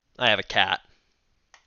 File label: cat